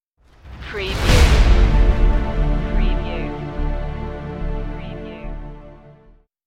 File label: stinger